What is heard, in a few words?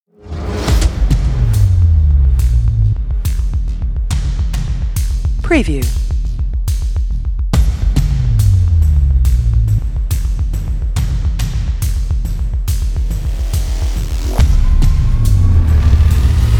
Trailer Intro - Cinematic Teaser Opener Riser Outro Countdown